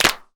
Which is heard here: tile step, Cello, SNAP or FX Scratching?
SNAP